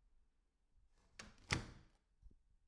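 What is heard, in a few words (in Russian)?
дверь открывается или закрывается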